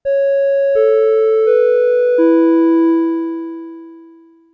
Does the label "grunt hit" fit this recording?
No